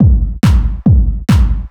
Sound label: hard beats 140, hard trance beats